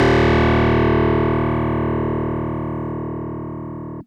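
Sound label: Fuzz Clav DX100 D#0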